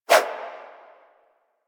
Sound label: Generic Bow Load Whoosh; Warframe; Foley